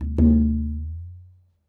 lower hit, tabla; Dirt-Samples

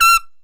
tight stab e

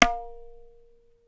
tabla; Dirt-Samples

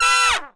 trump stabs chord

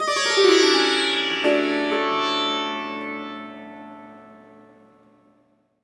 d minor sitar chord

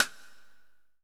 hit

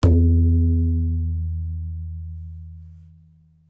tabla; bahia; Dirt-Samples

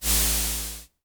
whoosh; sundance

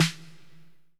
snare

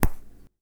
hand mono

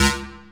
stab; Dirt-Samples

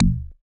perc

